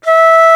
VENU E4, Old World Instruments, World Winds; E-MU Producer Series Vol. 7 – Old World Instruments; World Combos

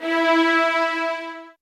SOFT VIOLINS

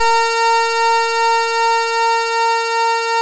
EMU MODULAB, MODULAR SYN; Sonic Reality; Vintage Timetraveler

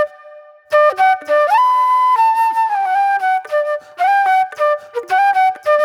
Classic Soul Flute

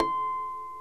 HARP; Greytsounds; HARP+STEAMER

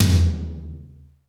AMB T, HALL KITS; Crypton - DrumBase5000

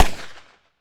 FX PISTO, INDUSTRIAL; Roland - LA Composer vol. 1

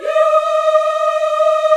VOX African Yas; Roland LCDP11 Africa VOL-1

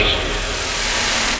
NOISE, Production Effects

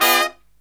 LOW CHORD, Killer Brass Riffs

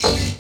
PERC, Drum & Bass; Ueberschall; Drum & Bass Flash Trackz

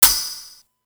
hit, brass

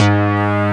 synth bass, Prophet 5; Greytsounds VOL-1

